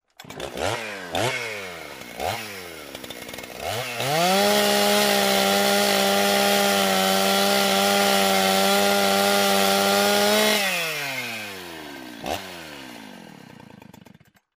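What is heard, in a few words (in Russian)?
бензопила распил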